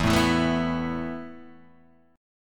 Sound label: E Suspended 4th E, down, Guitar, Half Step Down